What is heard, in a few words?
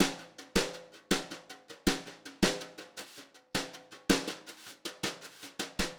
Drums, Jazz